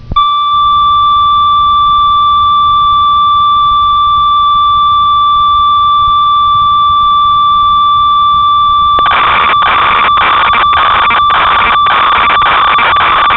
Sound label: ob6, synth